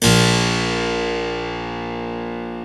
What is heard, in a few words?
Harpsichord; E-MU Producer Series Vol. 2; More Studio Essentials; Keyboards